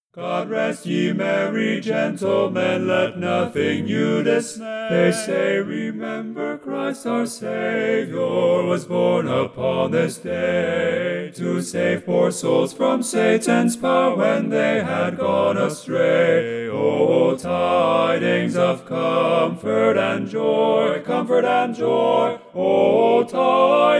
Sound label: God Rest Ye Merry Gentlemen Baritone left